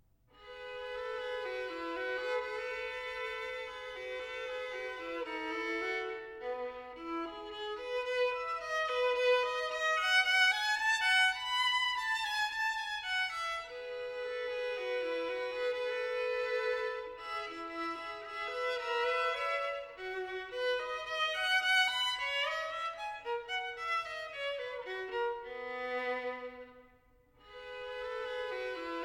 Partita for solo violin No. 3 in E major, Menuet II; BWV 1006